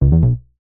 game tone error

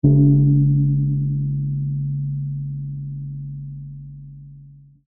bell large gong like single hit

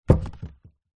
bottle wine in paper gift bag set down; foley

